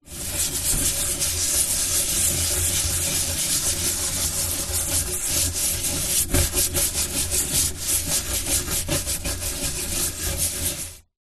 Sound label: Scrubbing Pan